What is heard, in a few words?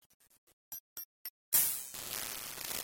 Splash Screen; Sega Channel Demo Cartridge #4; Genesis